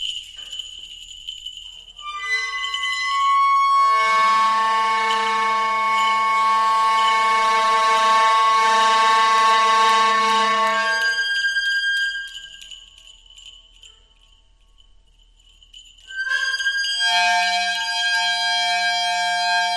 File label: Creature In A Forest